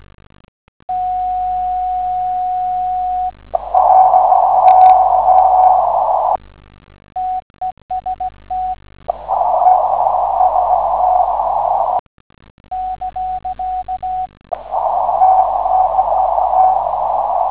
dish to horn

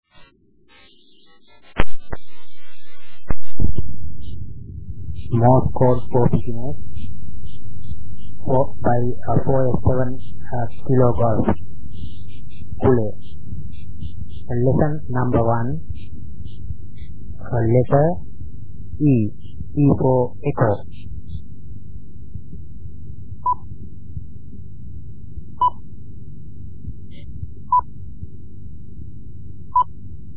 Morse Lessons

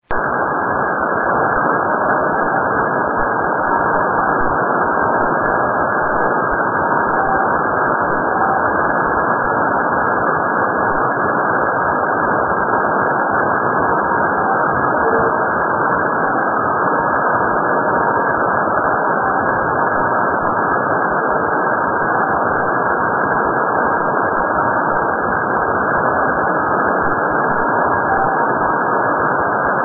UNID 8705kHz

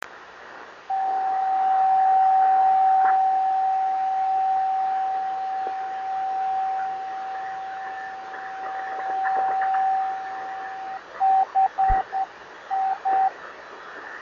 28345KHz

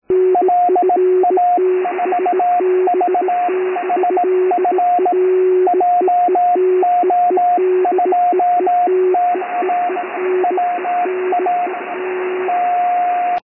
vhf beacon

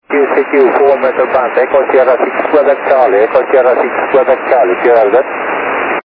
70mhz

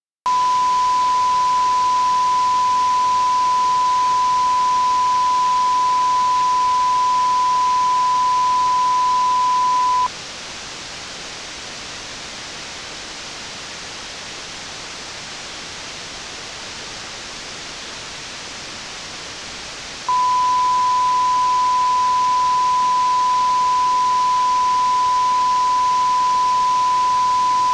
15dB SINAD OOK; RF Transistor

